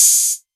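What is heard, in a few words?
808 Hat Open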